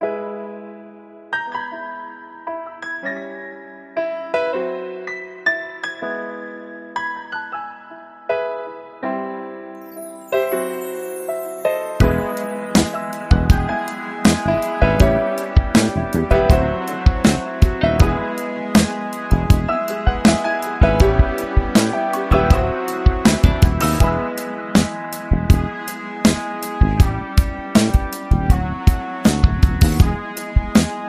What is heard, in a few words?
Piano Bar Regulars